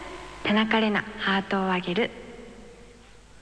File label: jingle